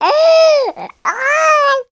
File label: human baby 3 months happy vocal; sound effects one